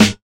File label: Snares